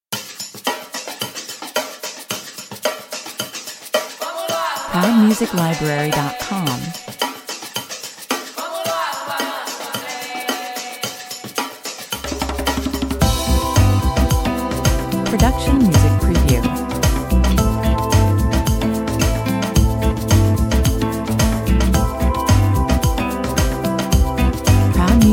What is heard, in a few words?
brazilian train 110 bpm